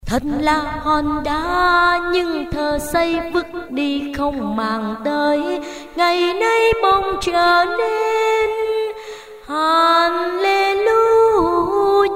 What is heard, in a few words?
basso